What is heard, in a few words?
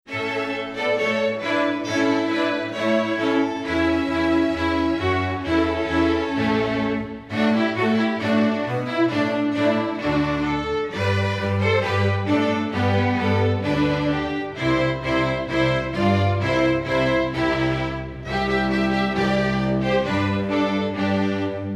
holiday fanfare, String Orchestra; Christmas